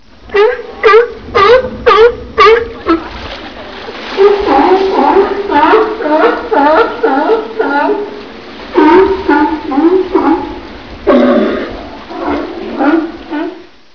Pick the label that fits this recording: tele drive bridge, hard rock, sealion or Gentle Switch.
sealion